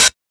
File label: damped pedal hi hat single hit g key, Royality free hi hat one shot tuned to the G note; hat samples